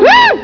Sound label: girly scream